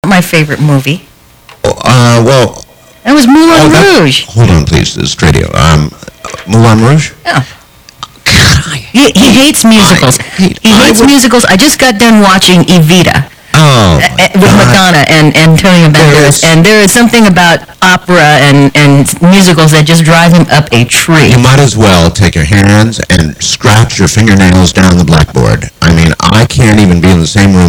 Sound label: musical